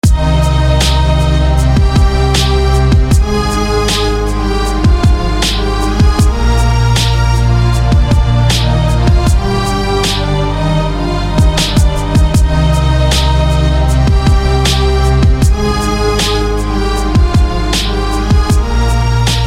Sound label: Thunder Strings